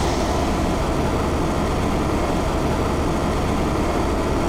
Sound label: jet loop; weapons